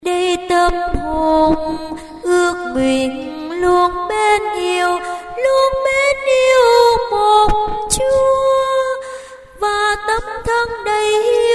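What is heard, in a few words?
soprano tenor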